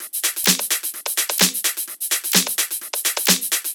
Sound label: Houseloops 128BPM; Vengeance Samples